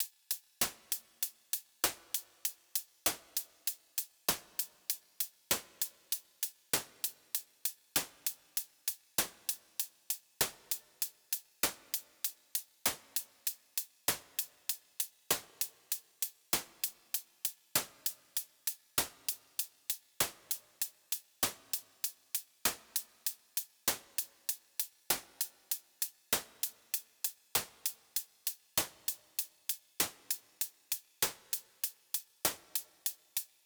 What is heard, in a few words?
Eventide Ultraverb Plate